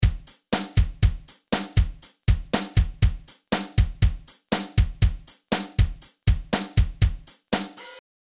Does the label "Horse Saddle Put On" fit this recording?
No